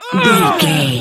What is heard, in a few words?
voice female effort push fast